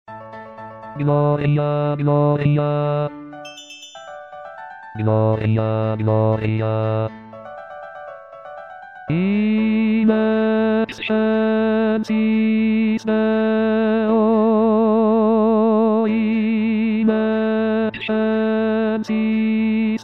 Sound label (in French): gloria vivaldi basse